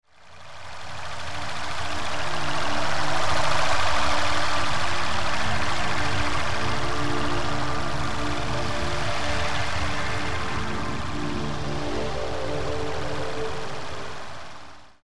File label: Ambient